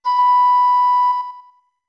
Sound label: Notes Flute B long